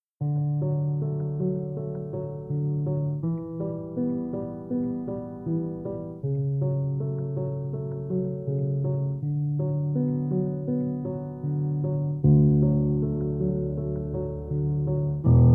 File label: Peaceful Piano Chillout